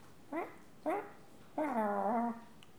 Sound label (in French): bruit animal